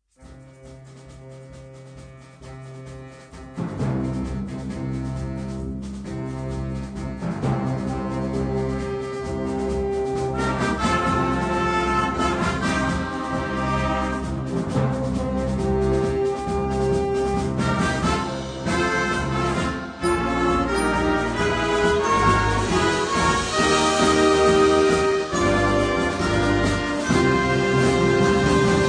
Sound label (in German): Ratsband Hornfestival; Gymnasiasten Ratsband Stadthagen; kultur